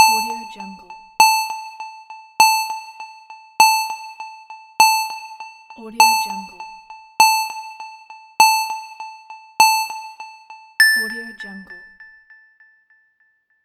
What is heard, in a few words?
countdown beep